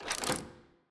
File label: Lock Dial Down; Puzzle